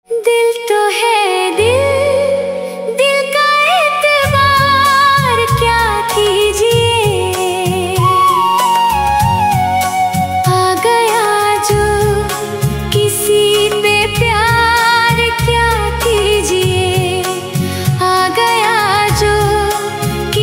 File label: AI Female Ringtone; AI Cover